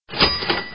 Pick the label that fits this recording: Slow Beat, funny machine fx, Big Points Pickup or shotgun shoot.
Big Points Pickup